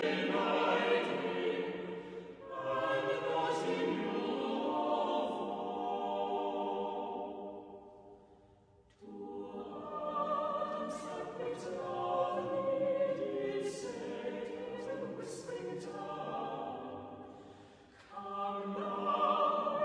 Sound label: carols